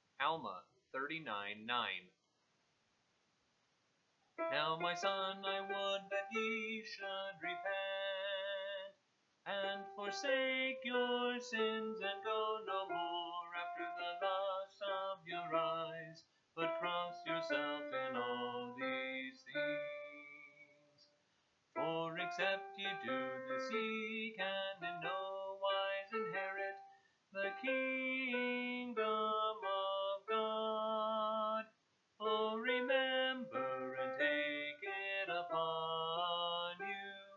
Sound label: vocal